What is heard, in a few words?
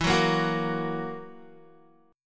E Suspended 2nd Flat 5th E down Guitar, Esus2b5 chord; strummed; JGuitar